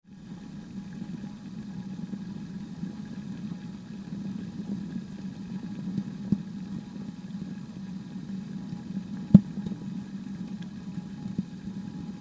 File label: sizzle